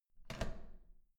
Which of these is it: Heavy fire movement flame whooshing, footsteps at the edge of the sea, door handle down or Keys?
door handle down